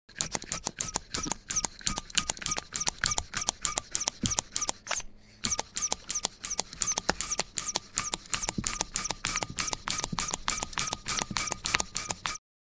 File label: Bicycle air pump